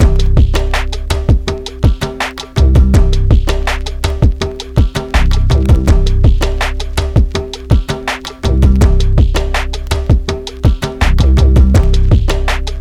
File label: Riddim